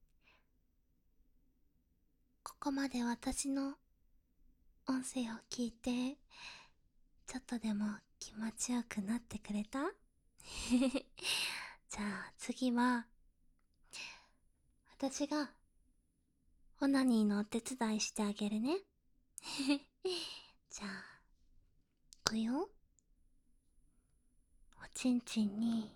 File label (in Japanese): シコシコ バイノーラル, ASMR